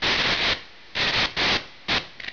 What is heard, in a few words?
sparks, ambience